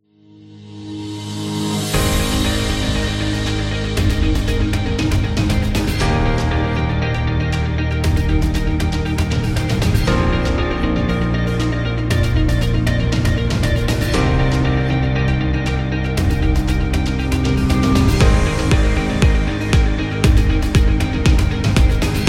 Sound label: A Motivational